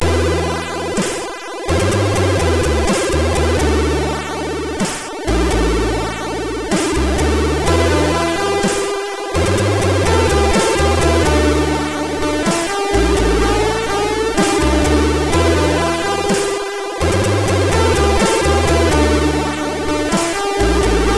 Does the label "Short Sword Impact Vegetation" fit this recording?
No